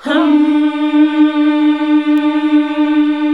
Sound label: HMM D#2; Best Service Gigapack 1